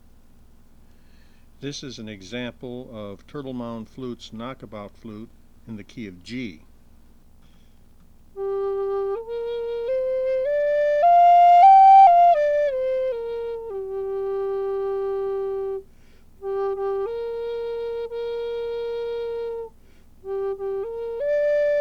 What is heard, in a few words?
Knock About G